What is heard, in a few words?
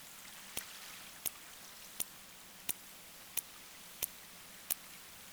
Regular clicks